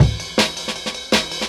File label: 160, Jungle Breaks; Original Jungle Breaks